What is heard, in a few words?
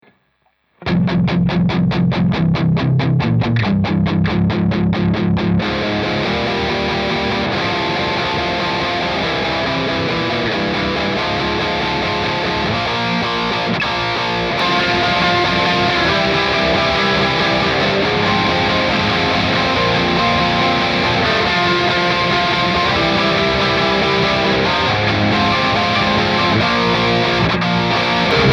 gtrs only